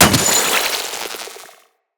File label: Container Open; Neurodes; StorageContainer; Material Specific Loot Containers